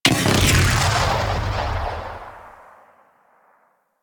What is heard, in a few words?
Explosion B; Warframe; Weapons; Corpus; Vandal